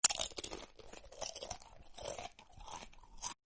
eating chips to attract dogs

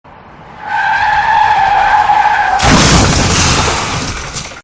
screech car crash

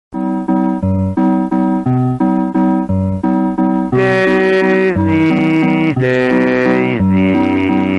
daisy bell; for gtag

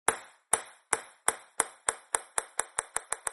bouncing ping pong ball noises

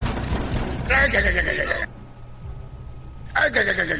laugh harharhar; mr krabs

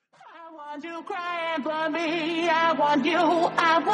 wah wah wah remix

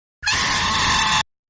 aaaaaaaaaaaaaaaaaaaaaaaaaaaaaaaaaaaaaaaaaa

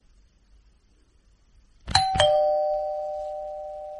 doorbell sound; to prank your dog

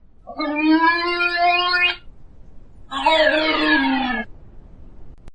groan tube noises

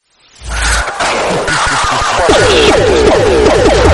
jump style

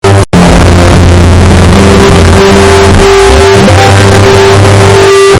notif bass boosted; win 10

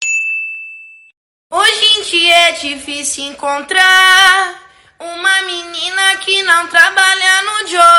phonk brasilenho

